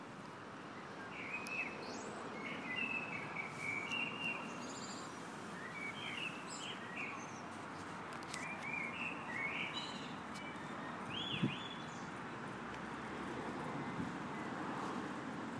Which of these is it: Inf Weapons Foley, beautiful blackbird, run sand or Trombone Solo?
beautiful blackbird